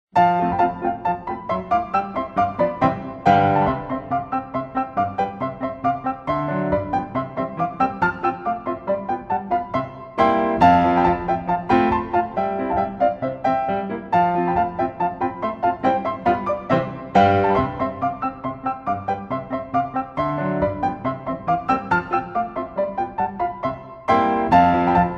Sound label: Battements tendus and battements glisses Music